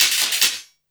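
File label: percussion, 150 BPM, drum & bass; Ueberschall; Drum & Bass Resonance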